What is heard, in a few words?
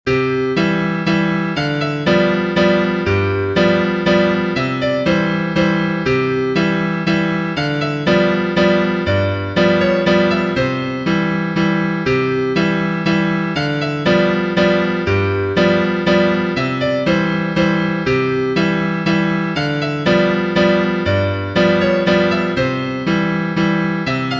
Swedish Dance, piano